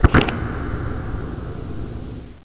door move

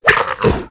claw miss, zombie

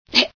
die, female

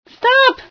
scream, female sci

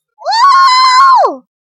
wooo

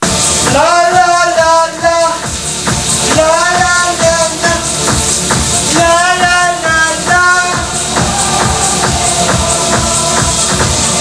la la la la

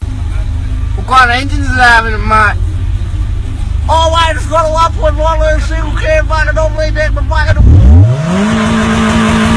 engine; sven

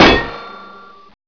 head hit; desastre

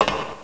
metal

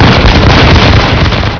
line fire; royal guards

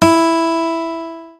guitar

